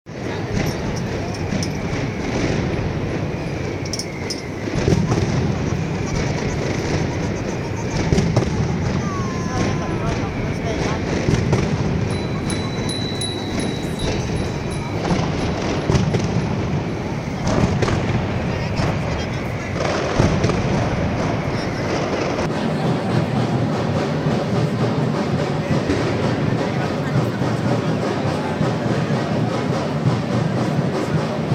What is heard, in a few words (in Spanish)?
Mascleta tambores calle